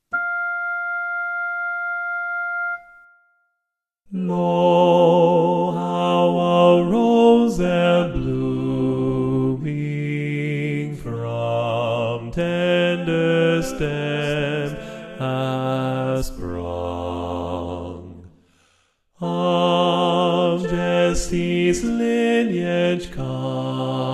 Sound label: bass